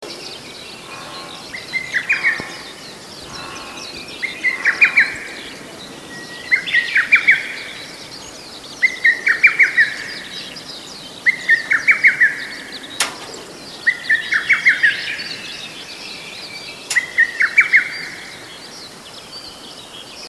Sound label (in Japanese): hototogisu